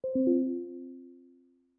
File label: notify email; Windows 11